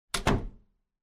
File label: door close; The Witcher Hunt